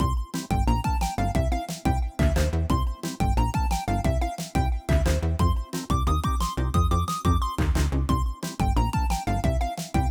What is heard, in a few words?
Title Loop